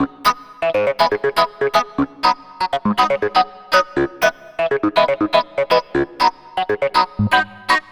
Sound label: Clavinet; Dr. Fink's Funk Factory